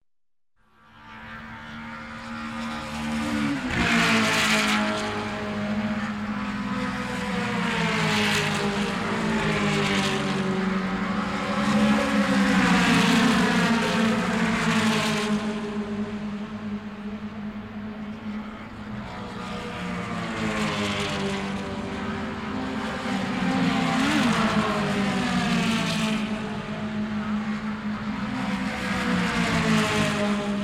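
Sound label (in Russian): гонки формула-5000 проезд болидов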